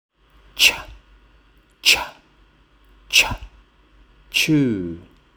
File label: chew